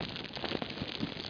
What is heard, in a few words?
crackle; TileWorld